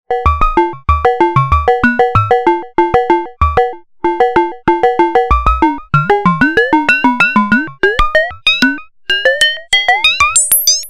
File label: 2 VCOs; Io Instruments Pasiphae